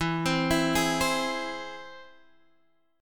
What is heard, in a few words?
Ab Augmented, E